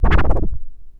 ST SCRCH, SCRATCH

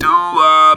Talk Box; E-MU Producer Series Vol. 8 – Platinum Phatt

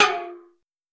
Can, Metallic Percussion; SN-R8-11 Metallic Percussion

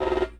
LOOP, 150 BPM, Drum & Bass

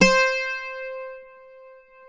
C4, Greek Bouzouki; NorthStar - Global Instruments VOL-1

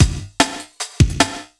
150, Drum Loops; Scoundrel; M8; Electronisounds

